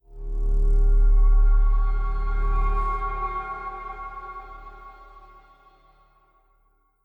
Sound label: Music Jingle Mystery